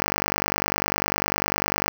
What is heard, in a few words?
125bpm 5-4 1bar; MidroSync